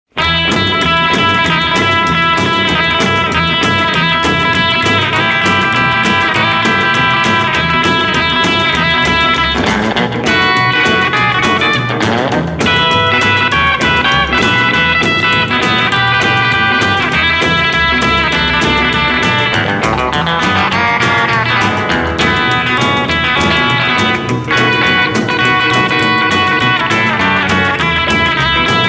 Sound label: ROCKAB INSTRUMENTAL, Rockabilly